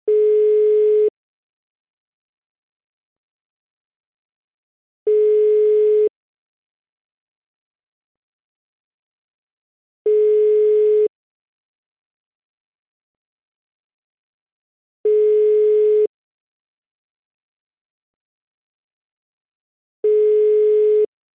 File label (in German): Freizeichen DE